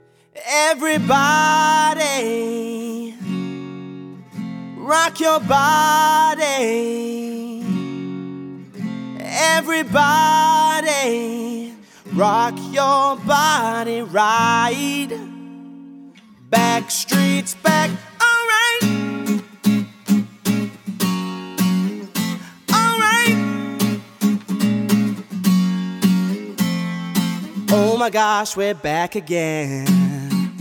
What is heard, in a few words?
Acoustic Soul